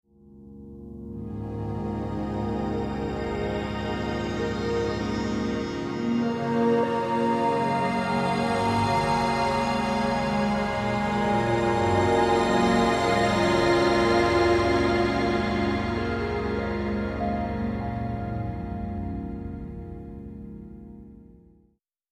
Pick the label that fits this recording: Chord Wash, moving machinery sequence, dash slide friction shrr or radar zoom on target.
Chord Wash